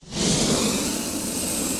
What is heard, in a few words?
Fire Wall Start; Zelda; OOT